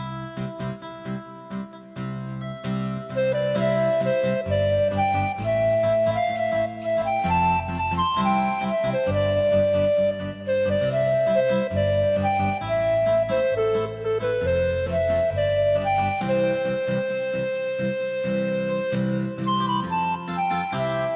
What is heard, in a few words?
Woodwind Dreams; World's Dawn